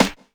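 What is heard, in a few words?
snare drum, Drum & Bass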